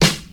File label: natural snare drum sound c key; Duration 0:01